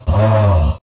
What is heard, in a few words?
ahh